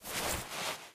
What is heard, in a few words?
workout pushup; taunt; player; video games; source engine; tf